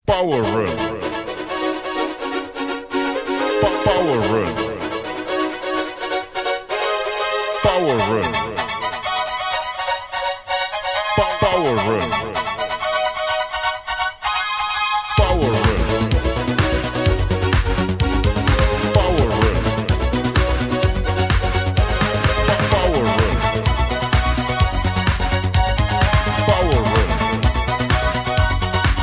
Laser dance

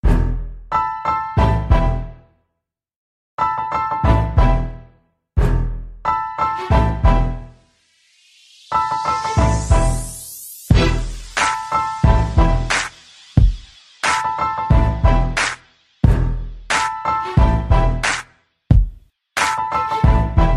beat

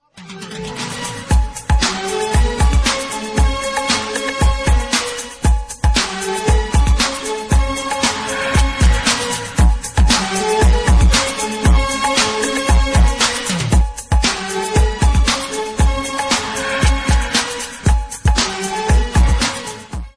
electro, ringtones; shaun of the dead

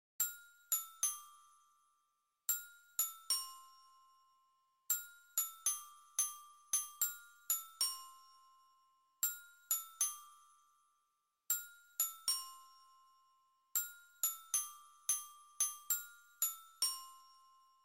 Glockenspiel melody

